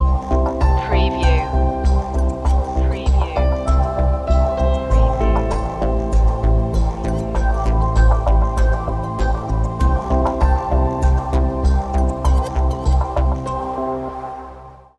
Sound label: digital evolution ambient bg